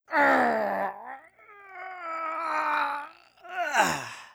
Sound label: grunt